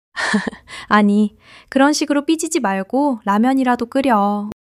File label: laughter